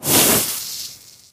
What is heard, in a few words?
Burnt Burger; Food Truck Game